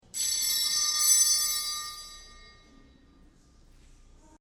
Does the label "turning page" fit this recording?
No